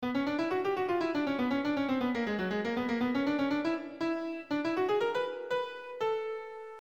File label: hammerklavier